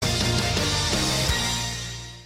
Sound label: Short